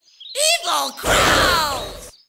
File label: Evil Crows